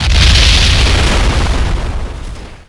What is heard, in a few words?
nuke; SuperPower 2 Redux V7A